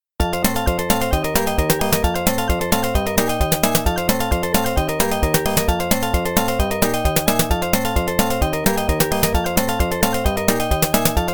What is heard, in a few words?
Continue?; Block Block; arcade